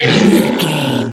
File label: troll monster hurt pain long